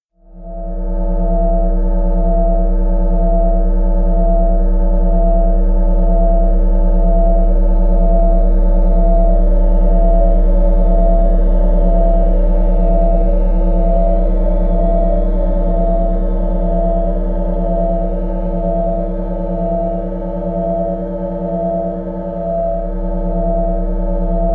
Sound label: Deep Bell Waves, deep